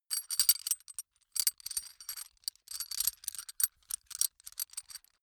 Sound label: metal chain rugged handling